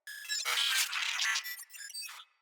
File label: Space Drone Attack; Enemies; Corpus